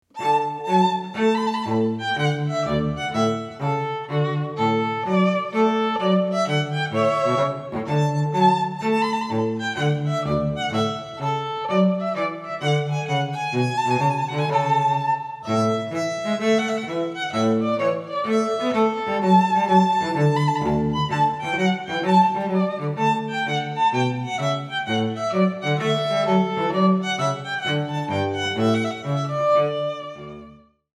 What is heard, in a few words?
trumpet tune; purcell